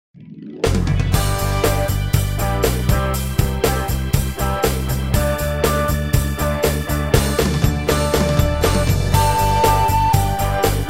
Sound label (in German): blockflötentaxi